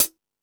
Lazer Drums, drum machine

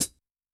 Pedal, HiHat; Yamaha DTXpress-II

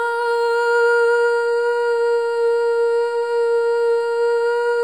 SOLO BOY, OH; Spectrasonics; Symphony of Voices

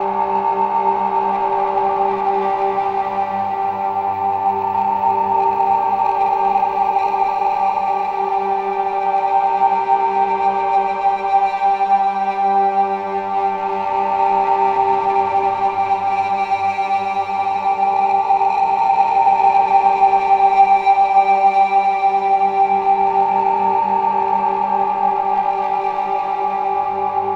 Distorted Feeling, Drones & Themes; Sonic Foundry (Sony Creative Software); Numina II

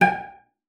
CELLO FINGER; 90 s Sample CDs; Xsample VOL-5

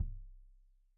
Kik Stereo; Deeply Sampled Drums; SMDrum

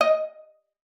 D#5, VIOLA PIZZ; Xsample VOL-5; 90 s Sample CDs

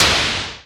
noise fx; Masterbits; Switch FX

